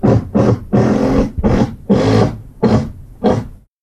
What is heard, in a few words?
electric screwdriver; 電動ドライバーのガッガッガーーーという効果音。; リアル 動作 工事 生活